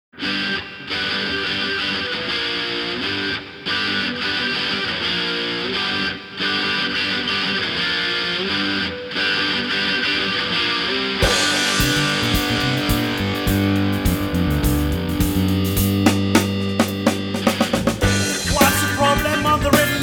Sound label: HIT